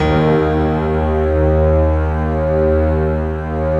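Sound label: D#2, PIANO STRINGS; Best Service; Advanced Media Trax Modern Composer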